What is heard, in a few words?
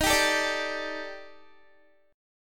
Eb Major 7th Flat 5th, Guitar; EbM7b5; strummed; JGuitar